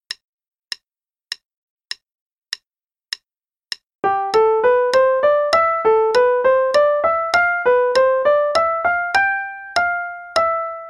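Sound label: Play-a-long, Dance, quarter note=100; Couperin; A Merry Dance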